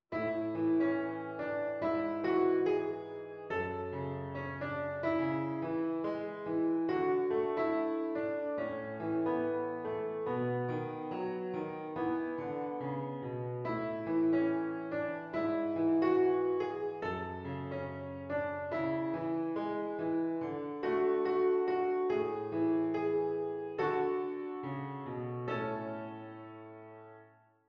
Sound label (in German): Klaviersatz einfach; Wieder nach langem Weg; Cramer